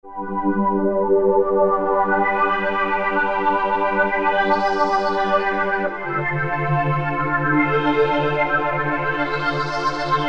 Pad, Korg MS2000; Factory Patches

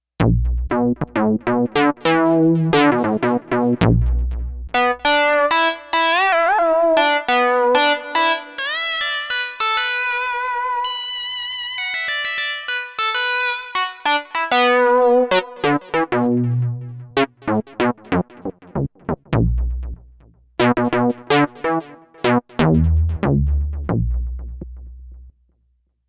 BASS LEAD

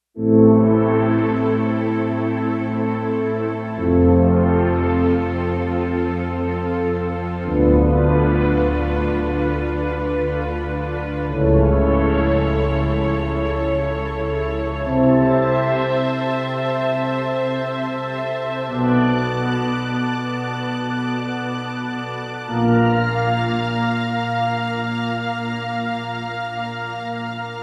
Slow Strings; Digital Synthesizer

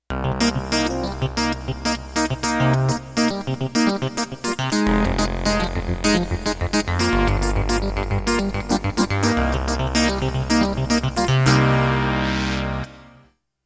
Digital Clavi, Roland VC-1

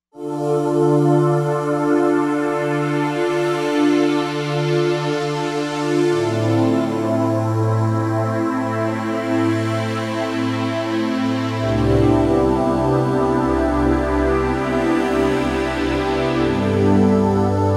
Choir

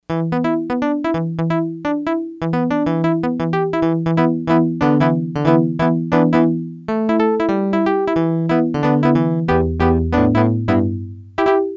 Pluk guitar, Yamaha DX100; Factory Patches

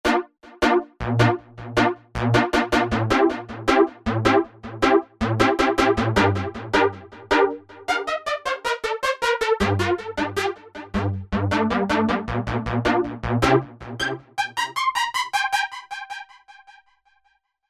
synth textures, Pulse; Inhaca Pulse; Apple GarageBand 2

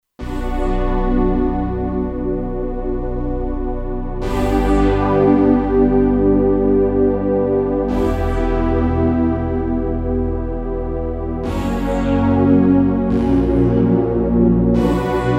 Mist Strings

